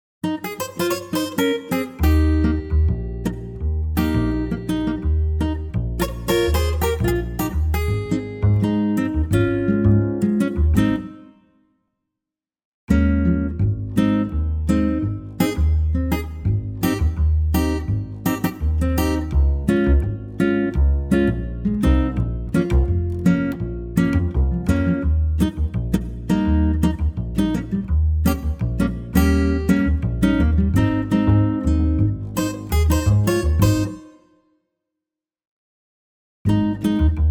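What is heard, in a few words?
acoustic guitar Ab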